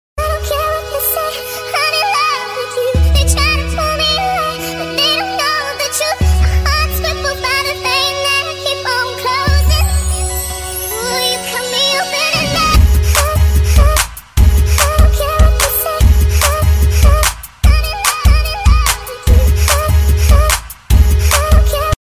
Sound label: baby song ringtone